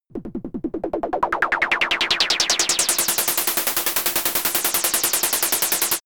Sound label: synthetic heli